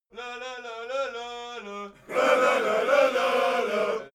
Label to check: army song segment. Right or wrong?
Right